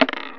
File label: brass wood; SpecOps2 MPlayer Demo